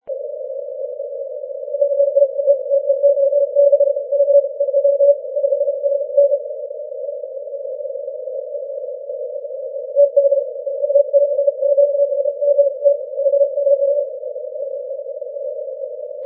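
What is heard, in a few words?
30m continuous wave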